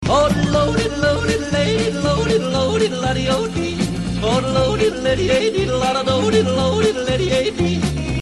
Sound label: jodle, danish